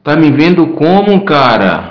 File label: berimbau